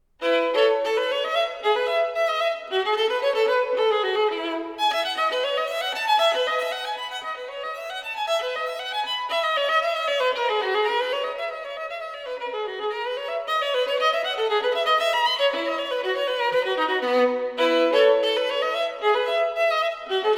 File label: violin solo; classical